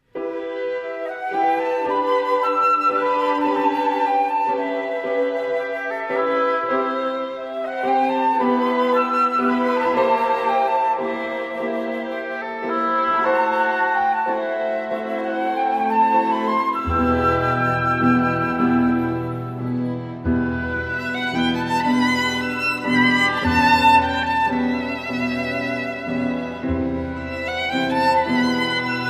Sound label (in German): Nordische Romanze Violinsolo